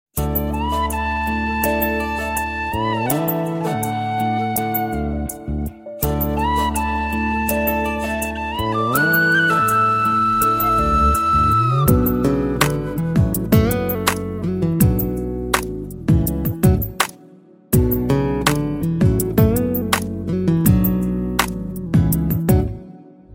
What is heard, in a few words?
Beats